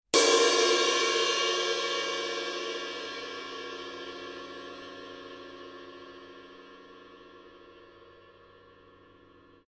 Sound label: 900 series 20 heavy ride edge, paiste